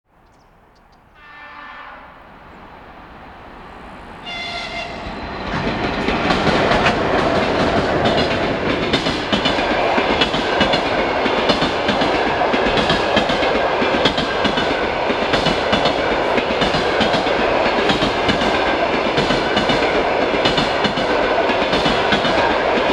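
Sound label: electric train passed by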